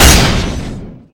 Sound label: damage